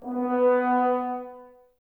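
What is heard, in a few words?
Brass French Horns B; Sample CDs; Miroslav Vitous; Woodwind Brass Ensemble; F.Horns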